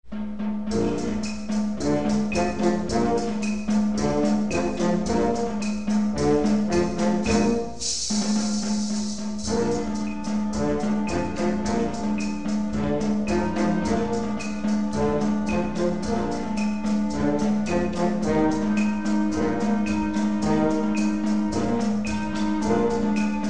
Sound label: Latin Lullaby